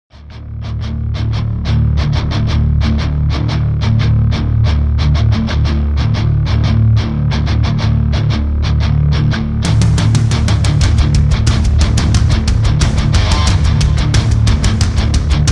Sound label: Instrumental